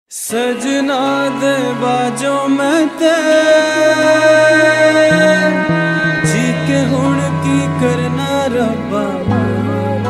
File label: Punjabi; 3 Idiots